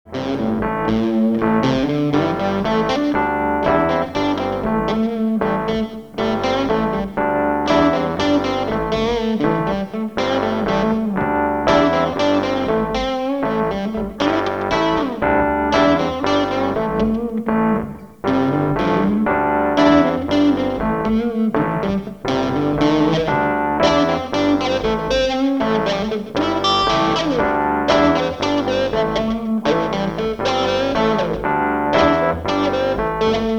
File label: Funky Jam